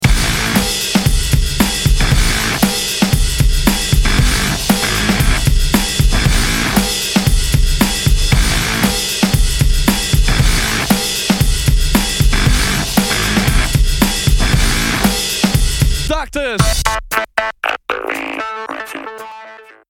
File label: Beat